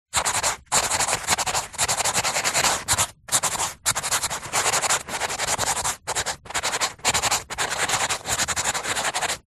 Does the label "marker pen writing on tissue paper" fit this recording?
Yes